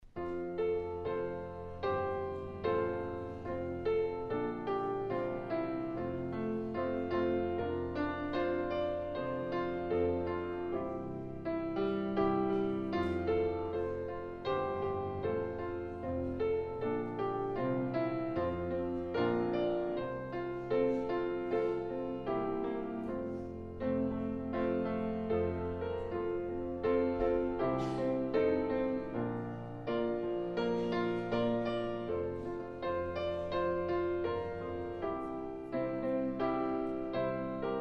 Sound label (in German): Vorspiel